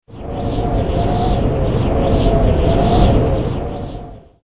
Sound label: element wind; maoudamashii